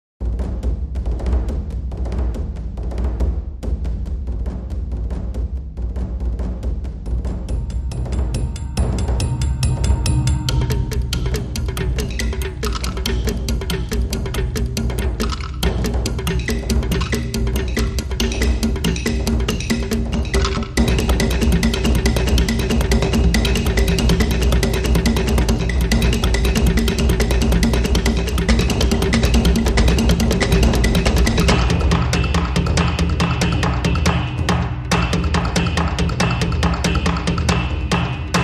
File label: Light Percussion